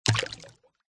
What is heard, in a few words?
nature water plop small splash